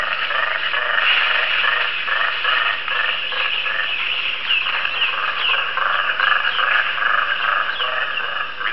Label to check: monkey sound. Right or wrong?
Right